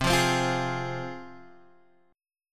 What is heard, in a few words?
C Suspended 4th Sharp 5th, C, down, Guitar, Half Step Down